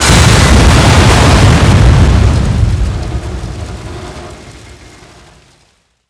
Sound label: crush; ambience